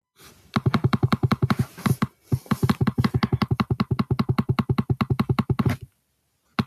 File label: tap asmr